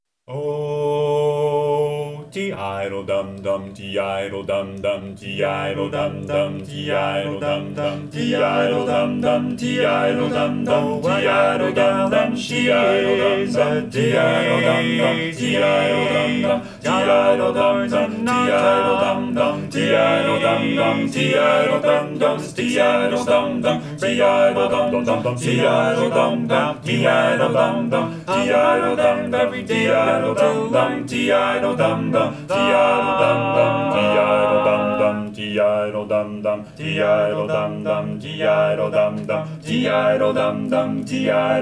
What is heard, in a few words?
bass right; 2024 spring show